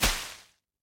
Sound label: Animations Planting